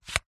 household phone charger wireless slide open; zapsplat

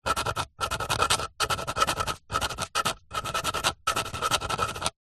office pen biro write on wood

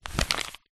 paper sheet fold; foley

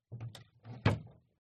Door Closet Close; uberduo

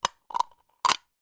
food canned tomato tin lid peel open

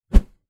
warfare weapon axe large object swing swoosh; zapsplat

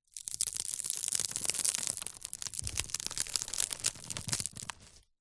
basket movement stress

